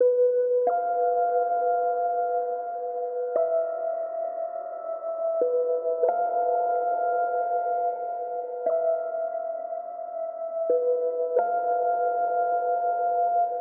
Em 90 piano riff

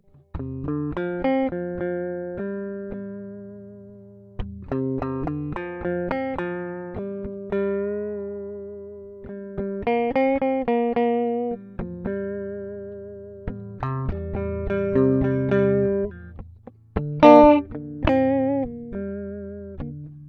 Gm 105 sad guitar impro

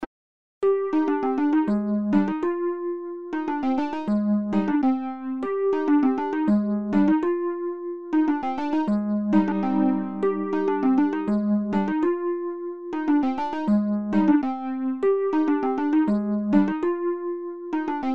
e piano loops

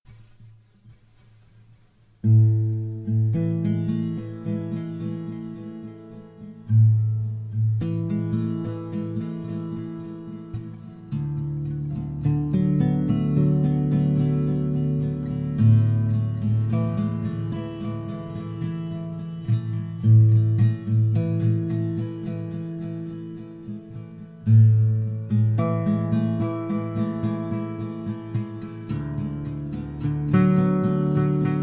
A 108 GTR arp delay

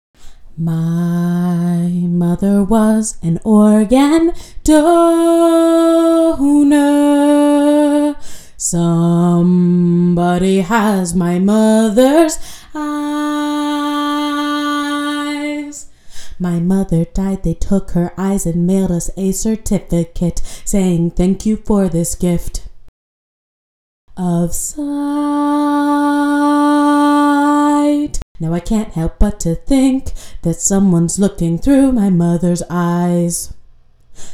Vocal Stems

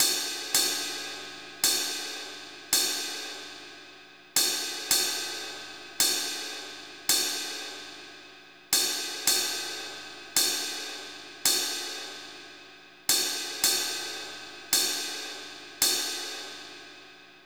drums

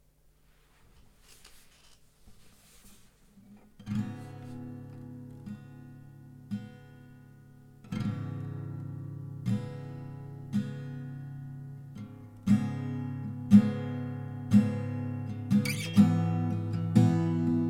C#m 120 Acc GTR chords dry

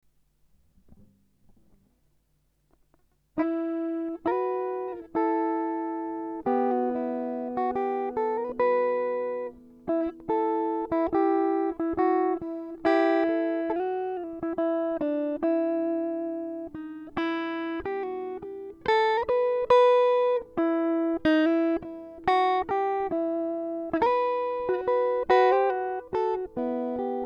Em 140 renaissance guitar impro

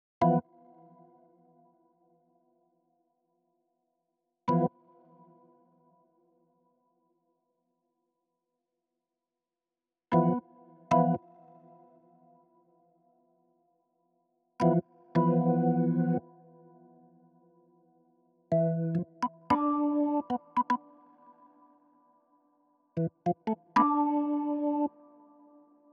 organ

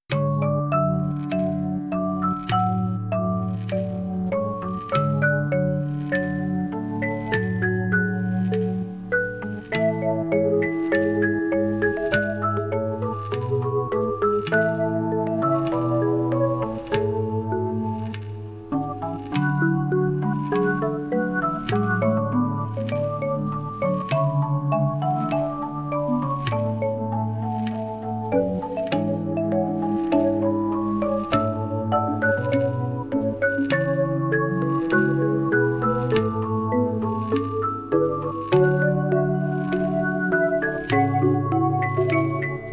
Malletty Melody

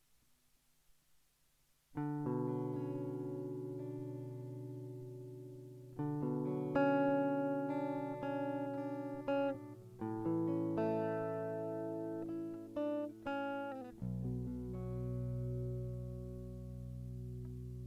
Ambience of Uncertainty

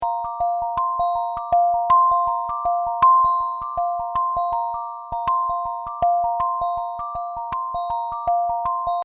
instrumental

